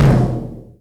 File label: Toms Real